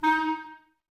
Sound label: Woodwind Brass Ensemble; Miroslav Vitous